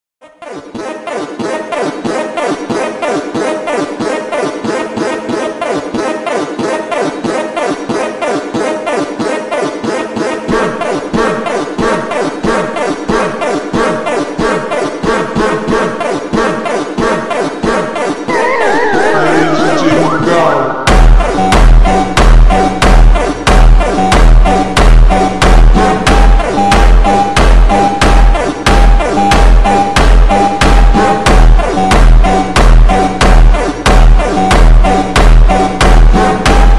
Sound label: slowed reverb bass boosted